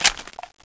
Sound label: reload